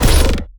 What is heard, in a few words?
knifethrow